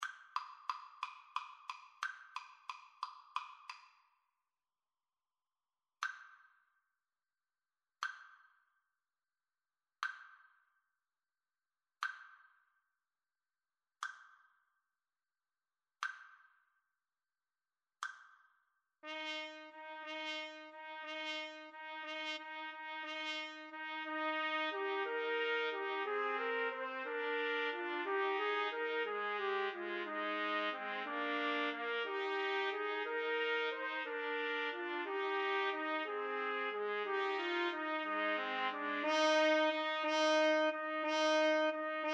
TPT trio, Trumpet Trio

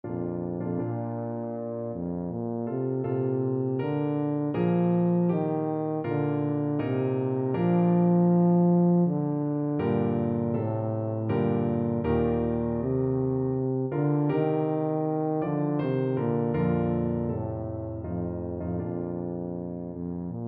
mendelssohn nocturne, tuba

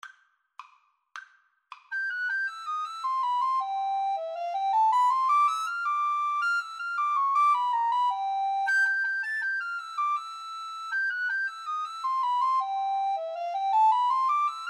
mexican hat dance, Recorder Duet

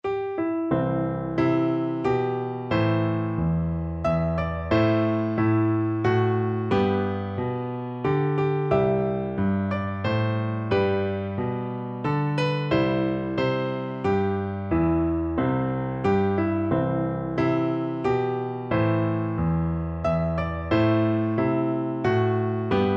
star spangled banner, piano duet

